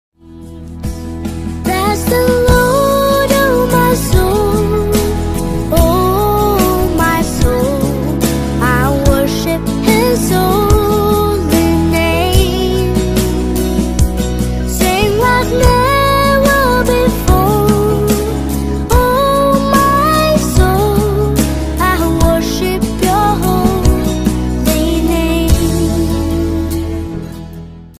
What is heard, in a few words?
english christian song